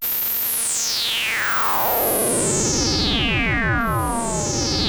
MOOG A#3, Synthesizer; Hollywood Edge; Giorgio Moroder Rare Synthesizer Collection; MLTI.MOOG

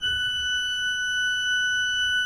Cathedral Organ F5; Propeller Island

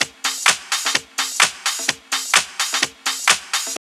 house loop

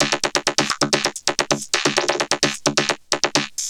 Vocobeat; Sonic Foundry (Sony Creative Software)

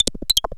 TONES, SIGNALS; AKAI Anime Sound FX

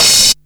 HH OPEN; Big Beat Samples & More; AKAI; HHOPEN1 KIT

